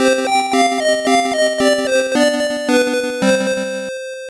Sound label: Lose